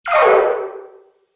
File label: Arcade Game Dungeon Magic; Video Game Sound Effects; Retro Inspired; Nintendo, Atari, C64